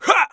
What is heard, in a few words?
warrior voice soft